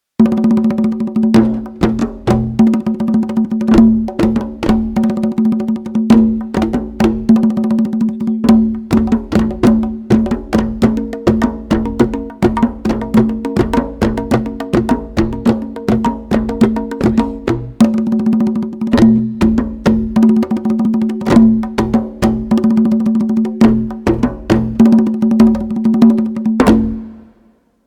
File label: drums